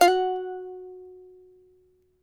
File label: charango F#3, Guitars; Spectrasonics; Hans Zimmer Guitars VOL-2